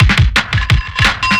172, breakcore

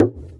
EQ Perc, Percussion Mix

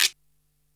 Boss DR-660 Perc, Drum Machines

